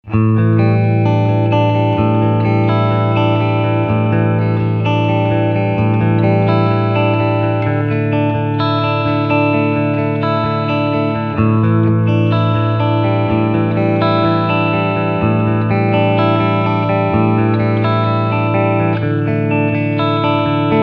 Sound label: Clean Picking